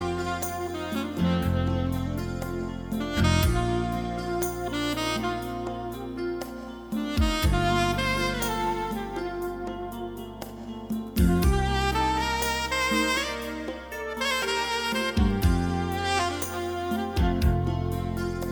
saxofoon

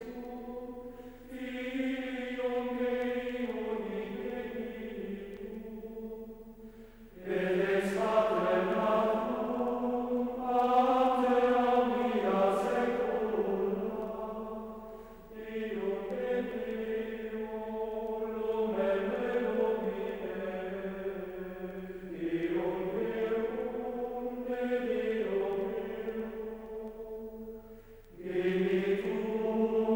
Koor mannen Benedictijner monnikken; van Sint Martin

